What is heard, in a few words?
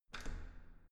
entrance door handle down normal